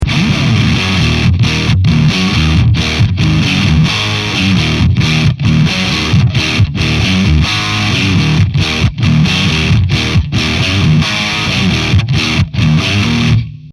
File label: Dual MH 500; fender